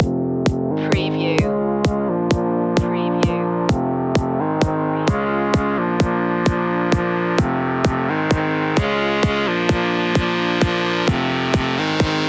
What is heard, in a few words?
sandy shoes loop